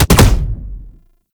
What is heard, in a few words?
Archgun Punch; Warframe; Weapons; Archwing; Tn Nokko Archgun